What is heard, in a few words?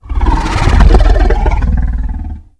roam, Dragon sounds; Unreal